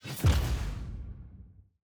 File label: draft ban enemy ban; lol champ select